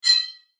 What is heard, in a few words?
Transition Fast Metallic; Multimedia; Blastwave